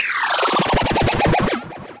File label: Saw, Effects; Saw Madness; Ableton; Glitch and Wash